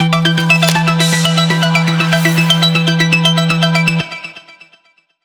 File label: Alert Sound Cheer